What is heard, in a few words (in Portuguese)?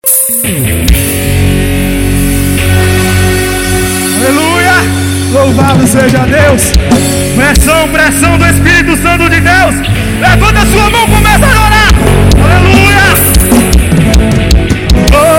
abertura